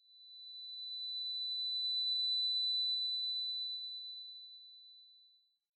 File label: Microphone Feedback